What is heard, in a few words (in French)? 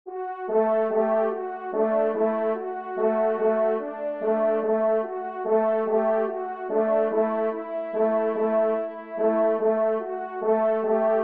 trompe; La Reconfortante; Ed Angot; Mayenne